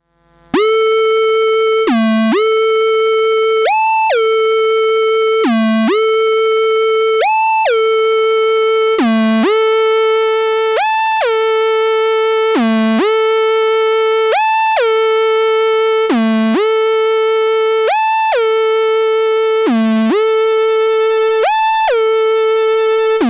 Octave Kitten II Basic VCO; Synths

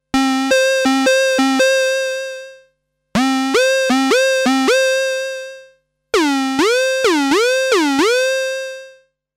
700S The Bender Porta, Korg 700S

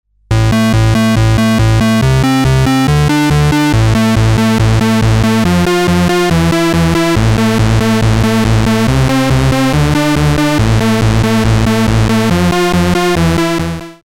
Roland MC-202 PWM

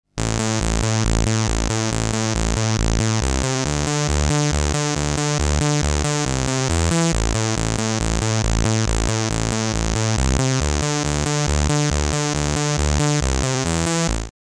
FatMan Oct Seq, PAiA 9308 Fatman Synths

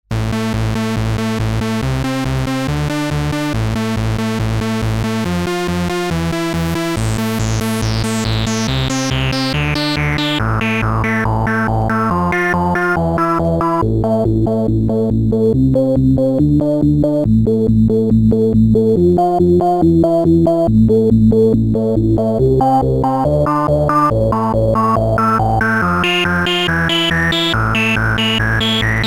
Roland MC-202 VCF

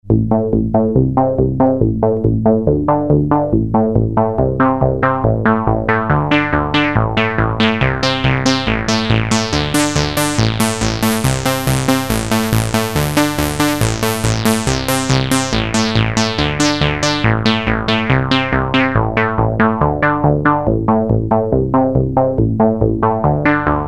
Moog Realistic MG-1 Filter

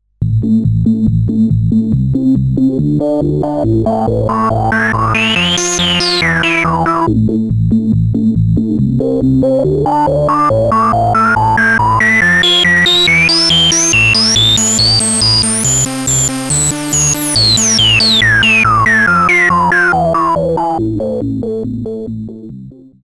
Roland MC-202 Feedback

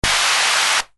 Roland TR-909 LT White Noise; Synths

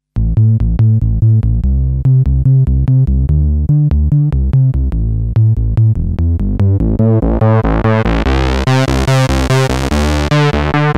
700S Traveler, Korg 700S

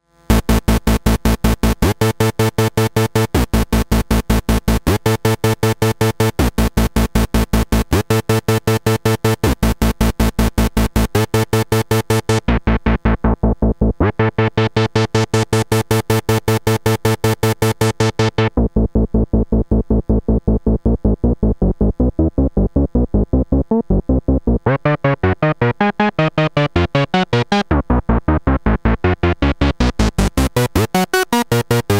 S&H VCO, Octave Kitten II; Synths

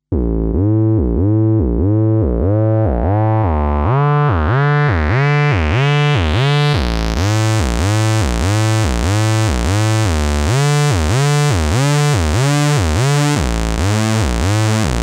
Filter Shape, Transcendent2000

